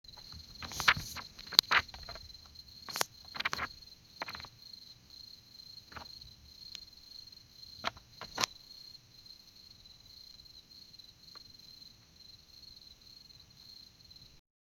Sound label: a realistic sound of some, foley